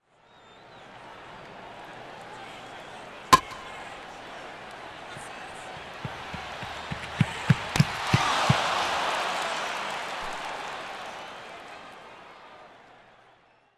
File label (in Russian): udar bitoj po mjachu